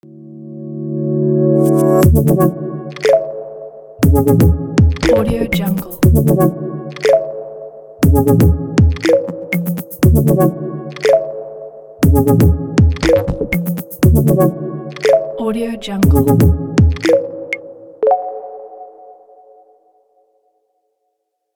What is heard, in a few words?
intro tech logo